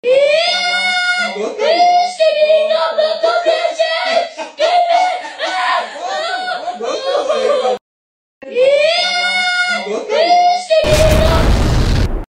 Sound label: guy screaming; skibiti toilet